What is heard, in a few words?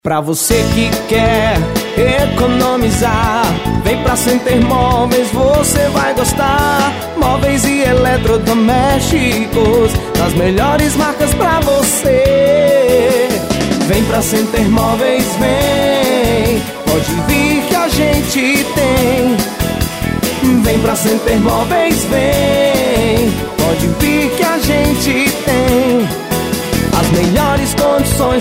JINGLE; CENTER MÓVEIS